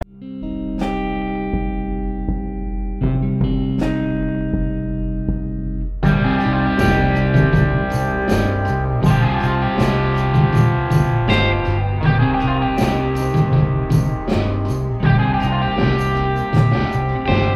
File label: Arcade music; Secret Ponchos